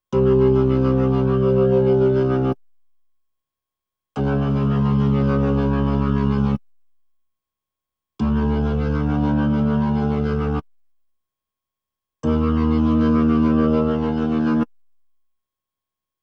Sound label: B3 Organ; Masterbits CLIMAX 8